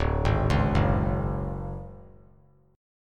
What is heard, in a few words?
D Major 7th Flat 5th D down 3C 3F 3A 3D, chord; arpeggiated; JGuitar